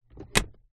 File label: transport car glove compartment close